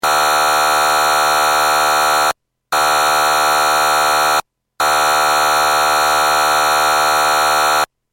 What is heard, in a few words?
xcel air horn